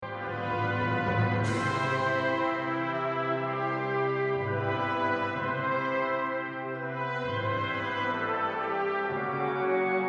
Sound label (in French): Impressions de Loire orchestre d harmonie extrait